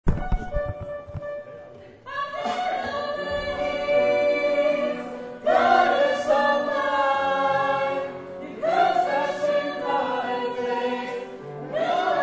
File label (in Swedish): bas